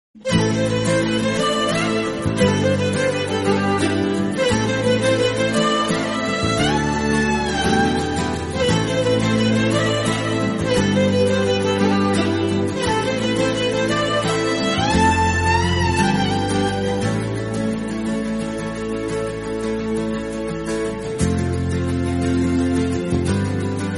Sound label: Bgm Instrumental